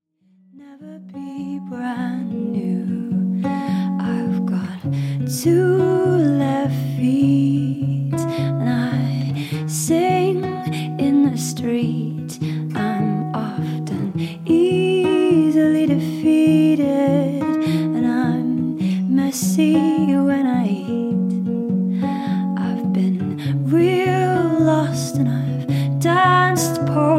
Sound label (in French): Acoustique Vocal